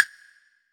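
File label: woodblock, drums; Yamaha SY85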